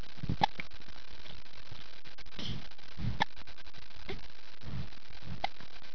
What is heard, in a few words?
hic